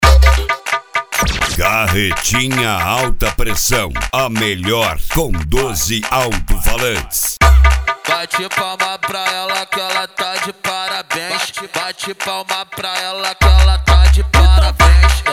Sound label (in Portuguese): ritimada agressiva